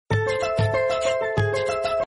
cute short message tone ringtone

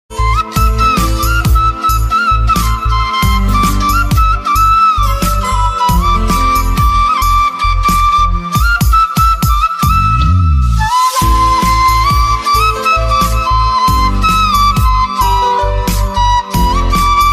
bollywood instrumental ringtones

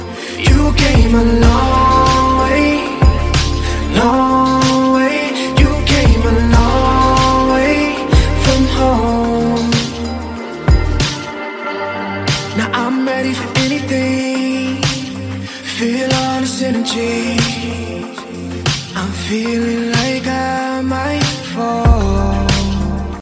ringtone english ringtones

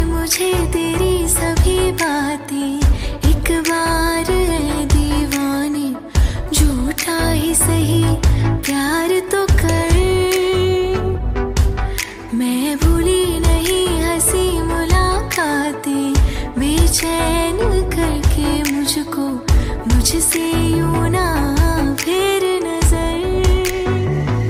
romantic ringtone

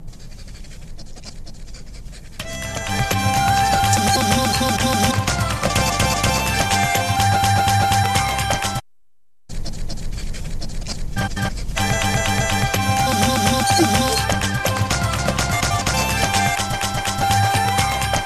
cool samsung ringtone; samsung a30

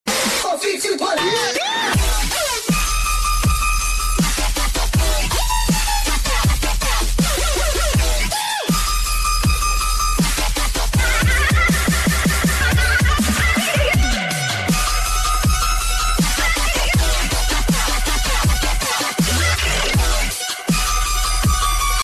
electro robot beat ringtone

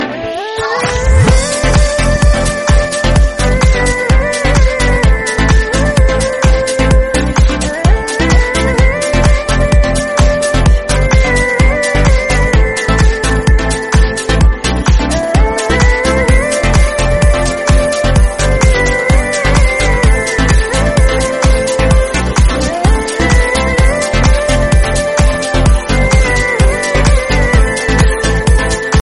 rocking fast music phone tone ringtone

